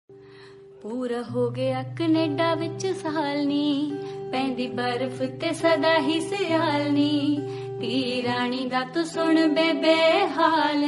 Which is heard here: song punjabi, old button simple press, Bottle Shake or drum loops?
song punjabi